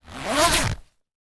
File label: Zip